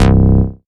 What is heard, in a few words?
Bass C, Offbeat Bass